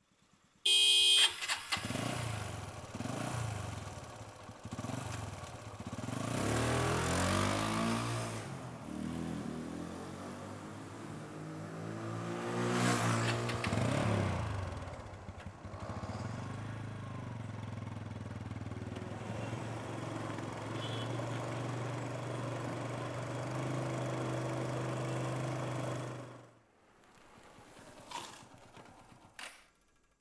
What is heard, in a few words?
Motorcycle 4 Stroke TVS Apache Sound Effects